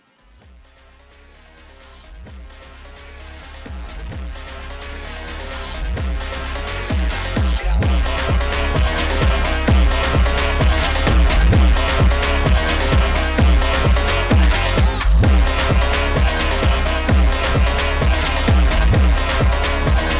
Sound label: funk do anime